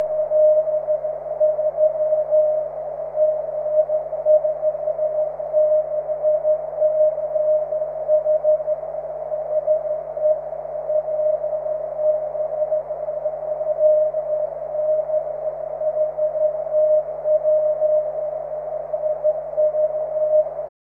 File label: cw; 23cm